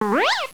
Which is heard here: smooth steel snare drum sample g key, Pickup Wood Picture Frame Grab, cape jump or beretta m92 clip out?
cape jump